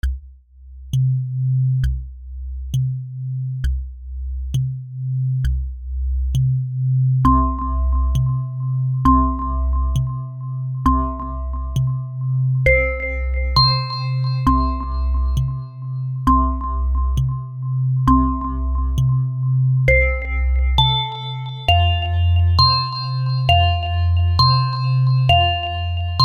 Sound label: Phone Alarm The Annoying Bell Song